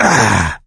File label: hurt minor; voice; source engine; left 4 dead 2; survivor